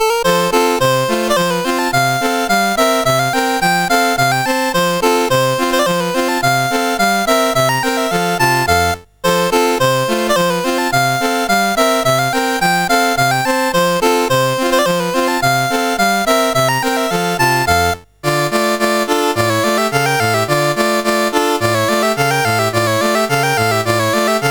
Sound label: Polish Polka, CSG8580R5